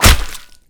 pickaxe hit flesh; garrysmod

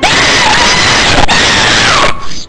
baby rocket; garrysmod

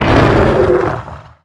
new deagle; garrysmod

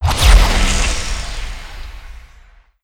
phase; garrysmod

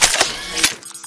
ion cannon out; garrysmod; weapons; e blaster

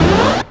pick adren; garrysmod

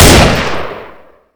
tavor; garrysmod

